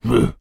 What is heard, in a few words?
human voice male grunt grumble caveman